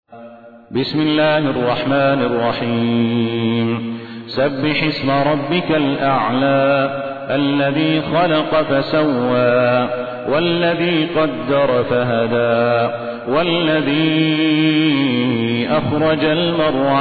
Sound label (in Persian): ترتیل; صالح الصاهود; سوره اعلی